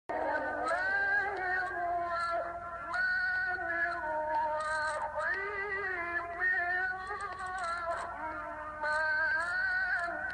تلاوت زیبا از سوره; الرحمن; استاد شعیشع

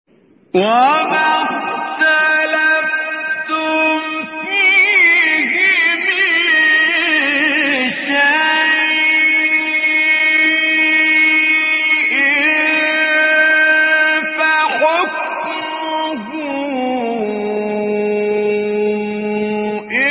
تلاوت جذاب و زیبای آیه سوره شوری; آیه 10; استاد عبدالعال

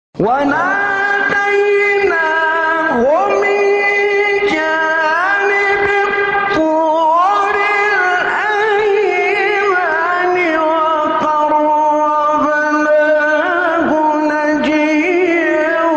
تلاوت زیبای آیه سوره; 52 53; مریم; استاد نعینع

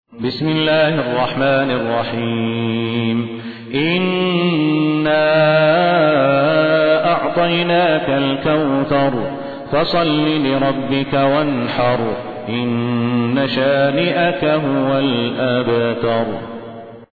ترتیل; صالح الصاهود; سوره کوثر

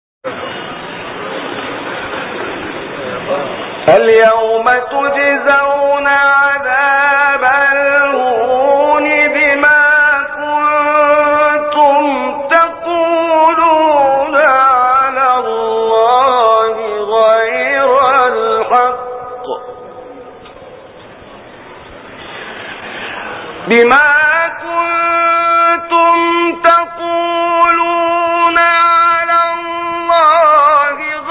تلاوت آیه سوره انعام; 93 94; محمد الهلباوی

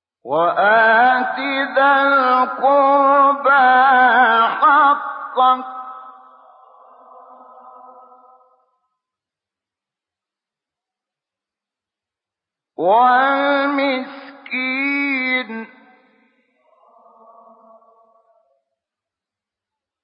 تلاوت زیبای آیه سوره مبارکه اسرا; 26; شحات محمد انور

